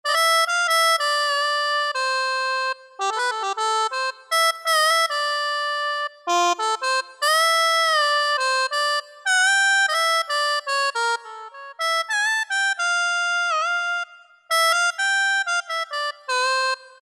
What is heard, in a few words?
Harmonica, Roland SC-50 Sound Canvas; Factory sounds